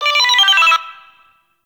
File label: Necramech Friendly Hack Jingle; Warframe; Entrati; Nechramech Hack